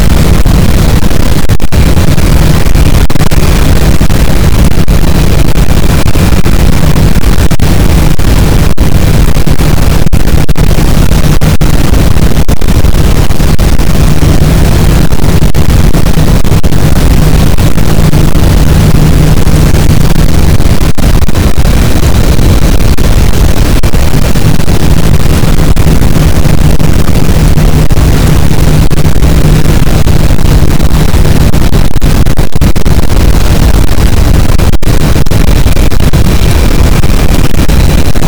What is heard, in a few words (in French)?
cachalot positif, boat, Physeter Macrocephalus